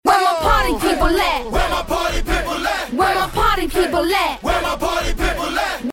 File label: ACA LOOP 82BPM